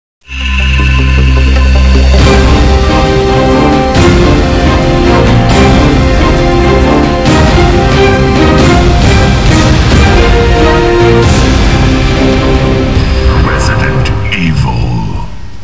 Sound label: intro music; svencoop; snarkbunk